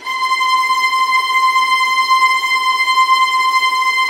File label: VIOLINT D, Synclavier Strings; ILIO